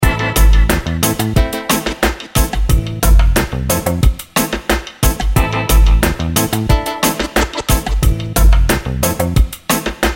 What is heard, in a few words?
Reggae, music